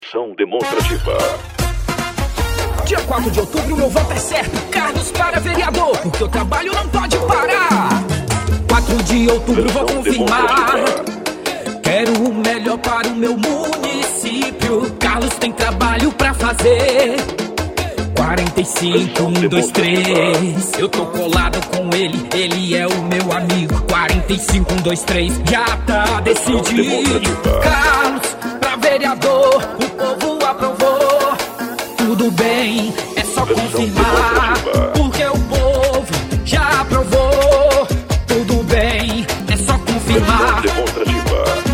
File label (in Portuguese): musica de politico, jingles politicos